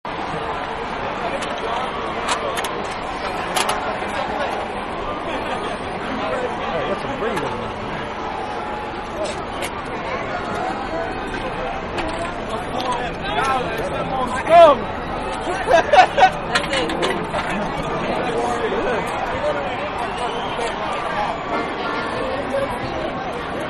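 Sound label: Inside Crowd Perspective, Parade-Within The Crowd; Parades (Sound Effects:Backgrounds); Manhattan Production Music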